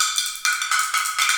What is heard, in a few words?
ROT DRMS, ROTATE DR; Spectrasonics; Heart of Africa 1